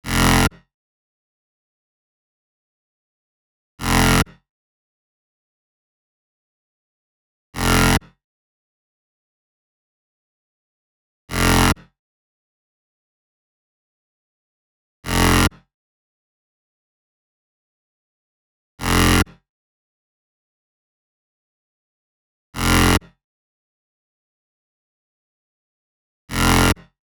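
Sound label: 128BPM Saw Add SC, Bassline, F minor; Vengeance - Essential Clubsounds Vol.5; Mainstage Kit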